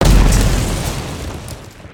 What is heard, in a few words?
strike